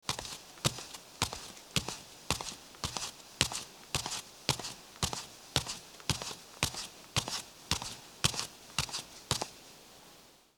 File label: Footsteps Bare Down